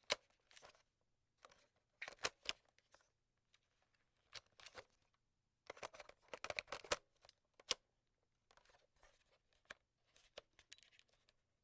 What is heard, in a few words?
inserting a cassette